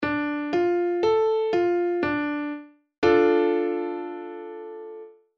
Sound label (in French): degre II; tonalite majeure